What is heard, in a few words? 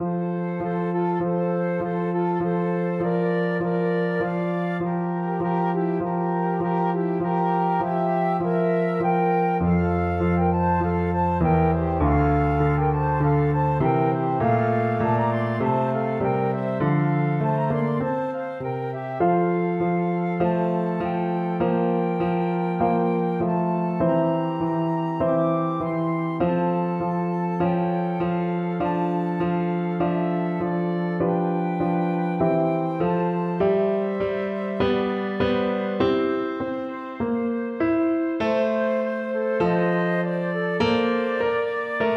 bach sheep, flute duet